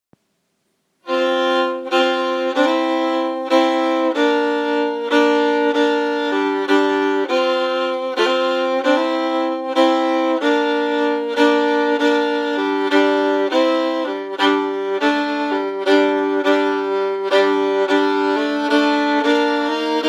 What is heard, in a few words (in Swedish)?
Ackord